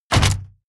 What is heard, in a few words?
mechanical switch metal medium push